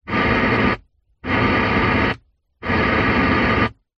car radio static on off check preset channels internal ambience